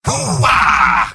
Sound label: cheers; soldier; video games; source engine; tf; mvm